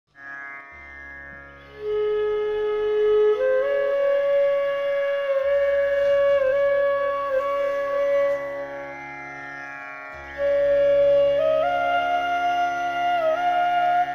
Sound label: flute, صدای نی چوپان